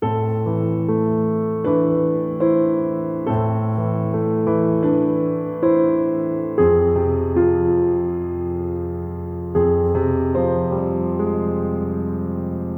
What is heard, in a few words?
Theme Piano; The Darkness 2 gamerip 2012